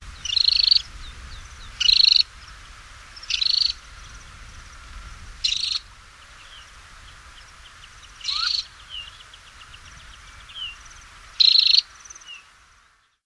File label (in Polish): biegus mały Calidris temminckii